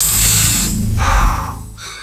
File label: synthesized mechanical pneumatic robot breath